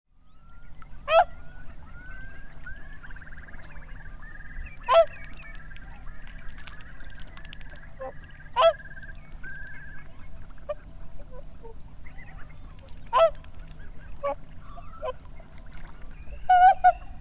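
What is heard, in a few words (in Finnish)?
laulujoutsen, lintuäänet; Voimakas, kauaskuuluva trumpettimainen joiku; birdlife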